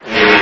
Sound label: door